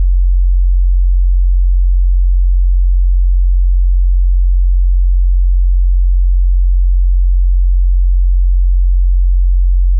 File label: sin 45Hz -6dBFS; tones